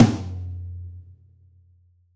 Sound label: Rock Tom, Roland BK-5